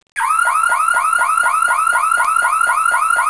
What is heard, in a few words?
Car Alarm; Vehicles; Soundsation